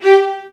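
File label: VIOLINS A5; ILIO; Synclavier Strings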